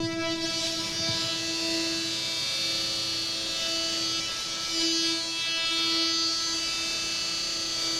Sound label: cable destroyed; Half Life Alyx; music; vault